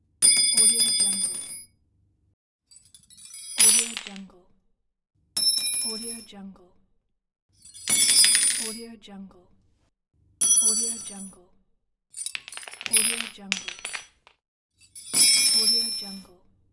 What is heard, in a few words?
drop tools